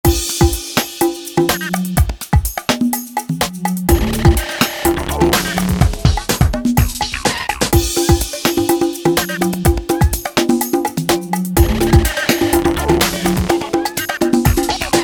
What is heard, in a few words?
Interlude electro